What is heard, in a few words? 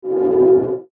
Transition Airy Whoosh; Multimedia; Blastwave